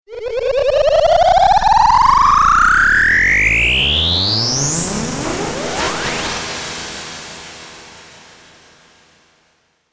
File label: jingle